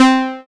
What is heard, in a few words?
SYN, Microwave; Sound & Vision - Gigapack I CD 2